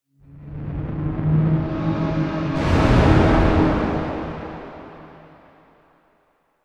Dungeon Door Horror Large Door Vocal Slam, Medieval Dungeon Door Large Door Creak Metallic Slam; Creepy Door